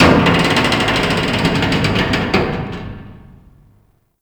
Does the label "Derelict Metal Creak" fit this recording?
Yes